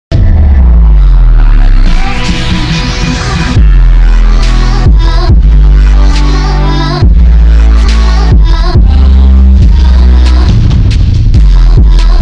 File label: BASS